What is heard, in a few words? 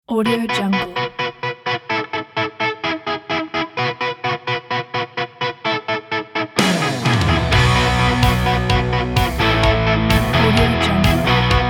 classic rock intro